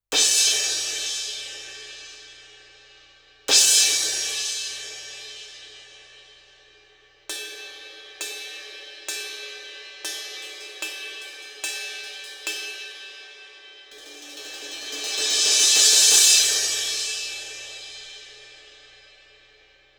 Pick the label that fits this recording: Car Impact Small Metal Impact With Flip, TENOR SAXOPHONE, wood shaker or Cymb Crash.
Cymb Crash